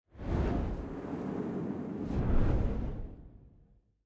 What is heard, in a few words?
map cloud transition